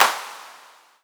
Clap

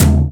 Drum Barrel Snare

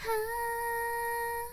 Choir; osu

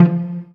Pizzicato; osu

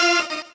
normal hit normal

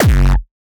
dirty kick; osu

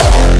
Hardstyle F4

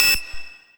lead

